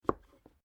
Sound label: MUSHROOM CUT; Various food preparation; Sound Effects:Restaurants; Foundation3